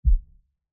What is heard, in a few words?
hover on